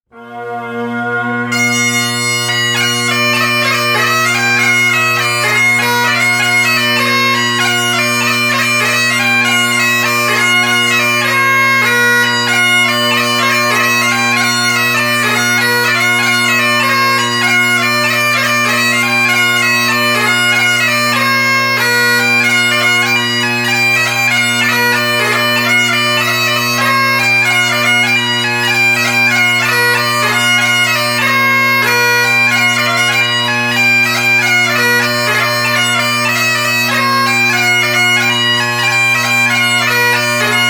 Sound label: bagpipes